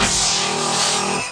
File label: Electro FX; Ueb HE Electro FX